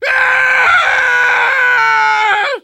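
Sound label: pain critical death; Soldier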